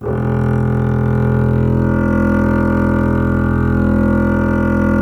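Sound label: string contrabass E; Miroslav Vitous; Solo Instruments VOL-2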